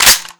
bolt slap, mp5; garrysmod; brightmp5

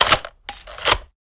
mini box in, minigun; garrysmod

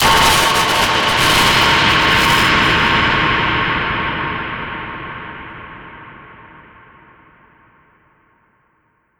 dramatic; slender; arrival

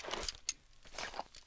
m60 cardboard remove; mg; garrysmod

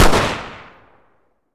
glock20 fire, pistol; garrysmod

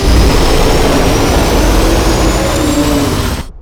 car turbo; vehicles; garrysmod; sincar

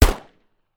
usp; weapons; usp45; garrysmod

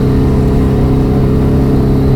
first level, car; vehicles; garrysmod; sincar

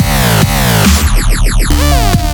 levelup; csgo; GFL; gungame

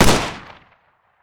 mac11 fire; weapons; garrysmod

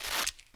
m60 velcro rip; mg; garrysmod

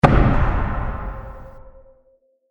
timer resume; csgo

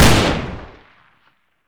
de fire, pistol deserteagle; garrysmod

